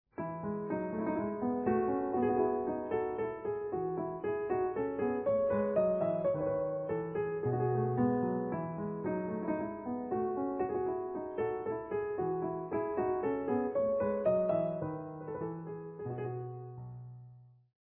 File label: Gavotte